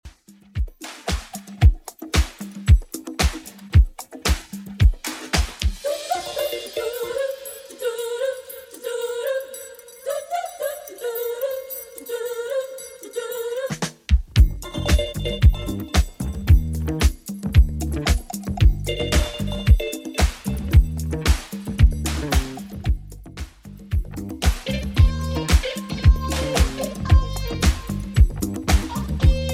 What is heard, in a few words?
80s Redrum, 113 bpm